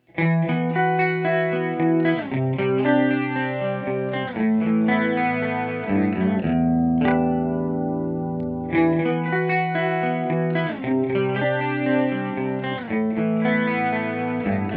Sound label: Hagstrom Clean